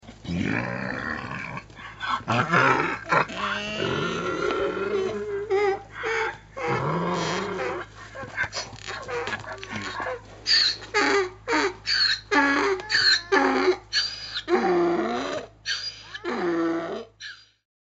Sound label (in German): Eselschrei